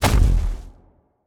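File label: movement footstep; smite; god cthulhu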